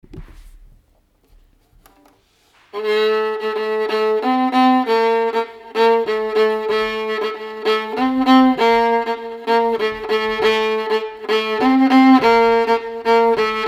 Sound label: riff